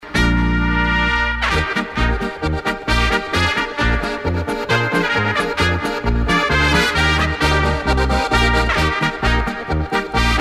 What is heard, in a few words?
Polka